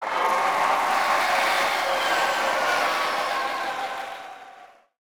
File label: Crowd Cheering; Pitch Black Audio Pong